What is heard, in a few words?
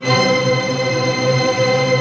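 string tremolo F#; Sound On Sound - Peter Siedleczek's Orchestra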